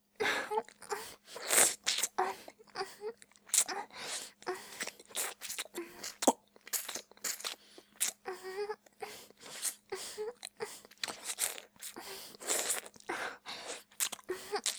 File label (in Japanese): 淫乱系音声素材, 普通にフェラ; Honey Drop